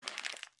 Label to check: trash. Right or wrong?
Right